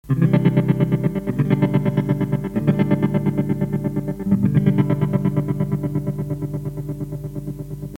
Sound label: TOREMOLO TURTLE FULL